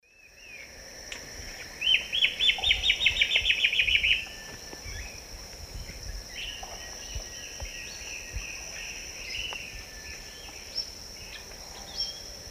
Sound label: Hypocnemoides melanopogon, duet; S. America; Eastern Lowlands